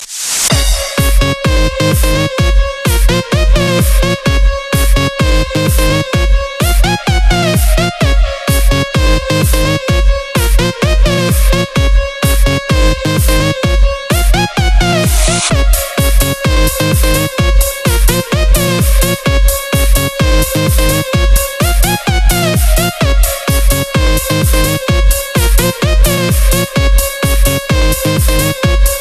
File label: Russian Electro